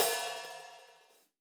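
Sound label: HH long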